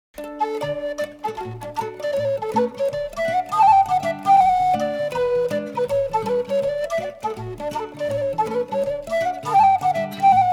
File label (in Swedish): Glaschottis